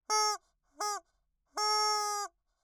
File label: long slow chicken clucks; wonder-junction